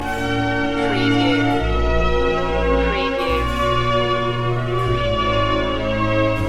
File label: baroque loop